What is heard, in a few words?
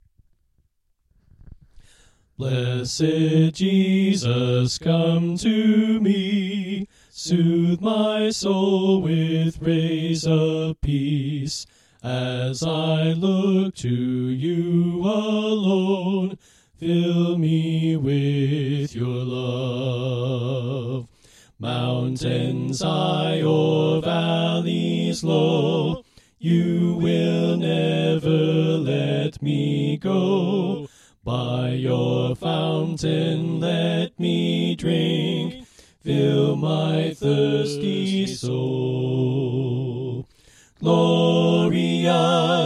Soprano Right